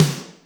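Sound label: Snare Verb, Alesis D4 drum machine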